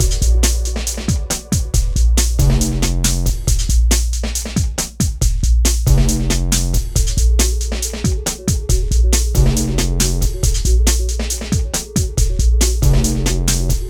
Hardcore Breakbeat, 138 Amin; Big Fish Audio; Hardcore Breakbeat Action; E-thereal

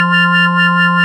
ORG Vox F, Continental Vox Wah Bar; Roland LCDP09 Keys of the 60s and 70s 1

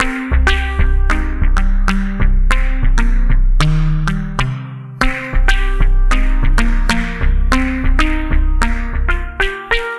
Melody, IDM

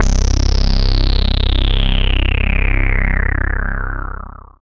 HOUSE, Synth Bass, Roland

